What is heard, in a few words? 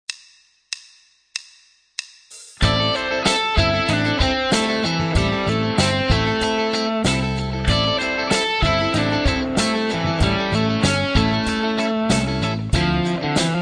Rock & Blues Solos; De Haske